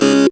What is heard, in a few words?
syn, Dance Synths, Trancers; Trancers 2